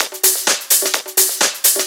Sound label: Discohouse Loop 128 BPM